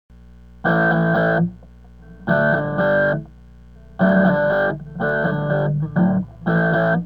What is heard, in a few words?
Riff